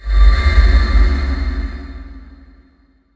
shadow clone disappear; sakkaku

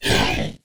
Monster Growl

